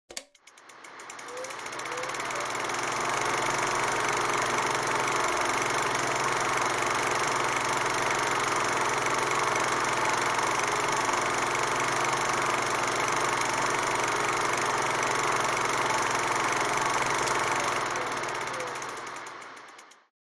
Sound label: Movie Projector